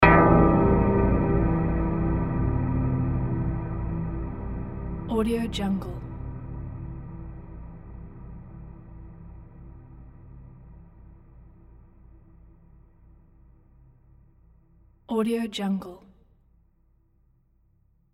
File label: ominous bell hit